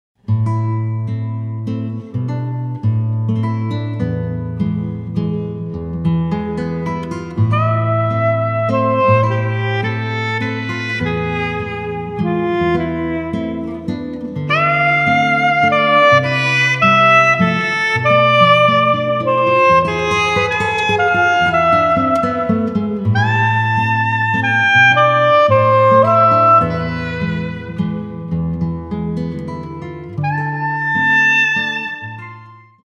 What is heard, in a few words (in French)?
Valse pour cor sax